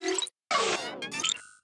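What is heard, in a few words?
reload element; cstrike; valorant; neofrontier sheriff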